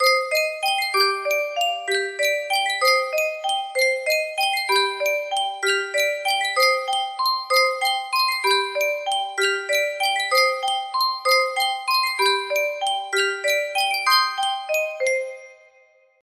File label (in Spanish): Sankyo caja de musica, music box melody